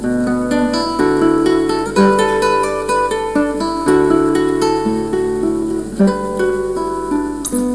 guitar

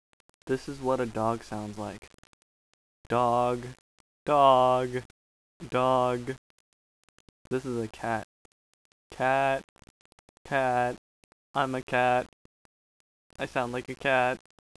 animal impressions

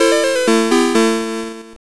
logon

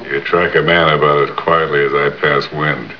pass wind

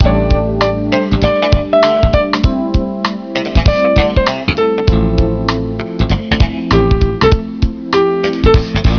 Lounge

